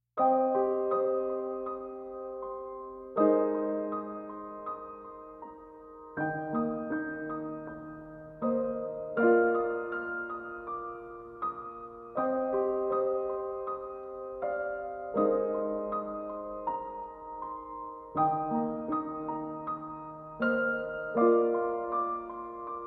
Piano Only

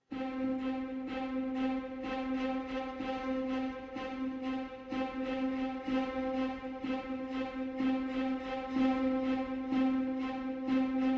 Instrumental

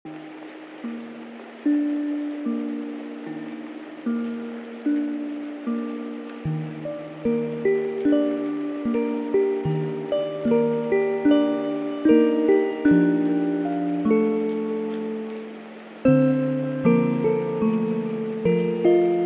Lullaby, Music Box Version

